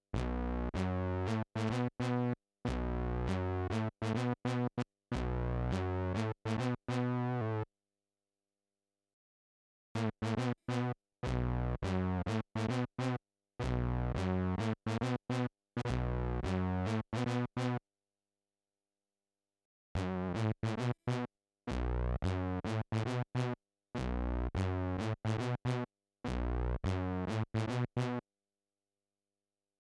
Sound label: Brass